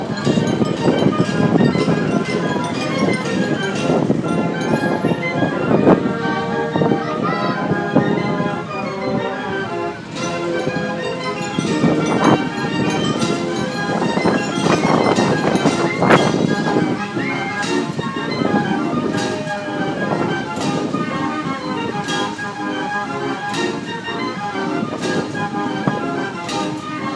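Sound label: carousel organ with the painted horses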